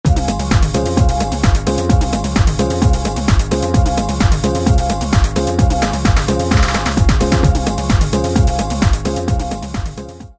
Detroit Techno, Roland D2

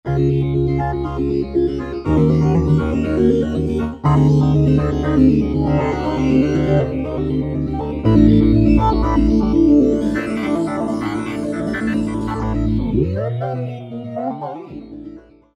Roland V-Synth; Baby Steps; Factory Patches

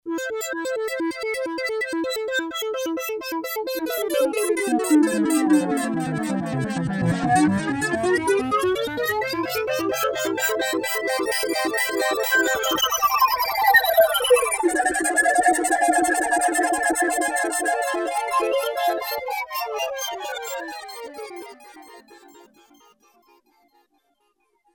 960 Sequence, Roland JD-990; Vintage Board

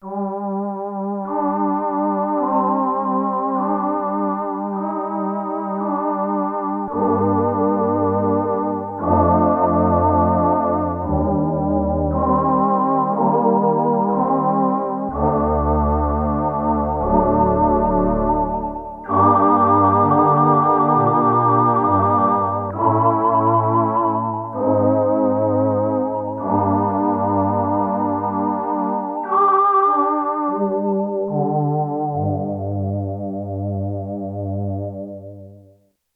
VOICE, Korg DW-8000; Factory Patches

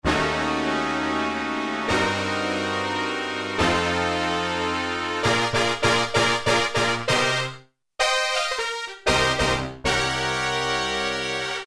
Yamaha TG33; Factory Presets; BR-Chill

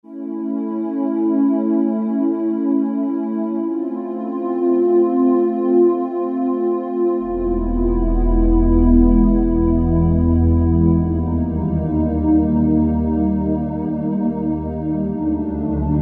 Ambience, Alesis Z1 Hip Hop

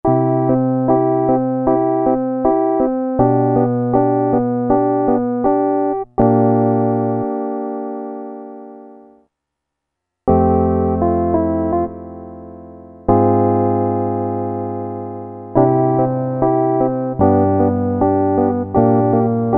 piano, Casio MT-68 preset sounds

